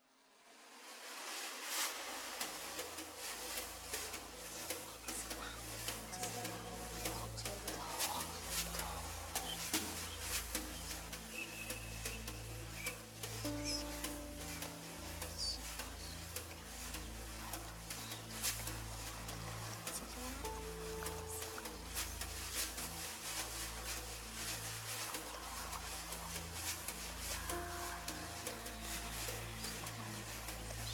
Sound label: transition brushes; citypercussion